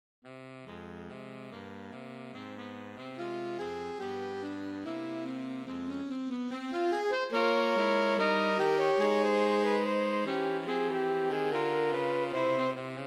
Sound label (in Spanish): danza; Cervantes Ignacio